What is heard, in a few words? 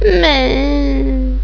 MUUU